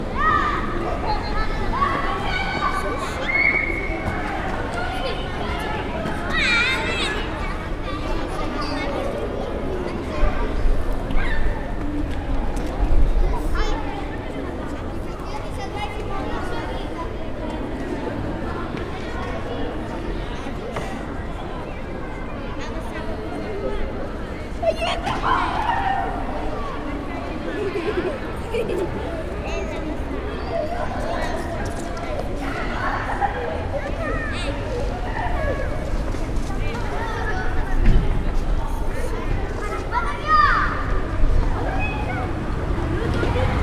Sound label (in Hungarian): jatszoter kiabalas